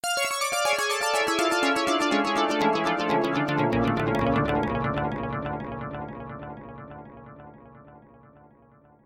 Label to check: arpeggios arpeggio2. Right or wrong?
Right